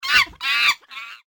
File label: chimp